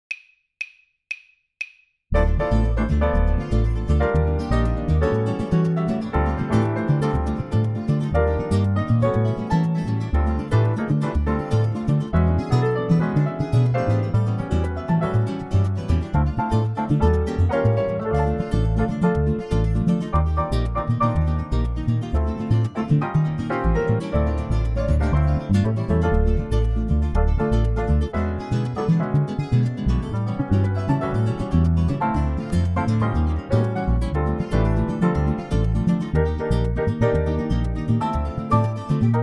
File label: alt style drums play along, jazz; All-State Jazz